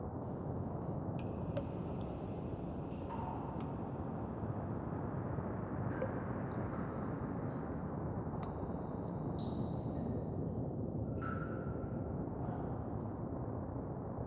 Ambiente subterraneo; Yesterday Origins

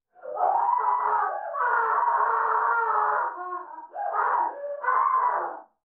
fondo grito mujer

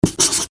Cuaderno escribir

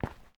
Paso zapatill cemento; yesterday origins gamerip 2016 switch ps4 pc ios macos

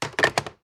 COLGAR TELEF INHALAMBRICO; Yesterday Origins

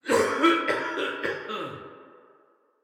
Hombre tosiendo; Yesterday Origins

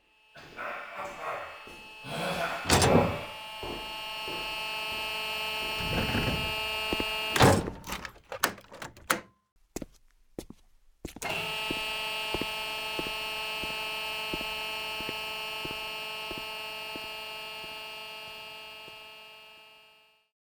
animacion salir puerta

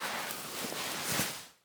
SENTARSE

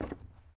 Paso zapatill madera; yesterday origins gamerip 2016 switch ps4 pc ios macos

